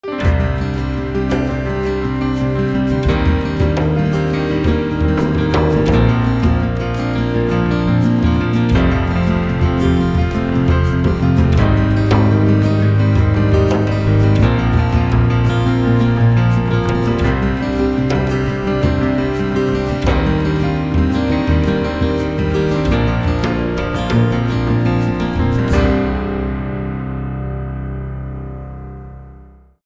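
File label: acoustic guitar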